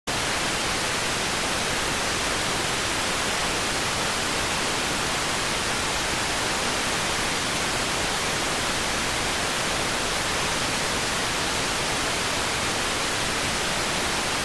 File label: rtty rx